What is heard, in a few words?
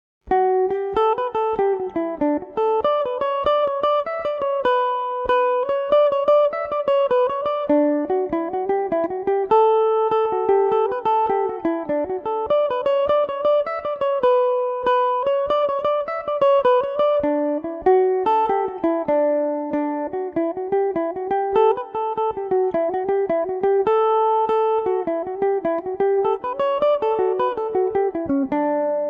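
D major revelation jig, irish mandolin; Home To Castletownroche (version 1); Revelation Jaguar electric mandolin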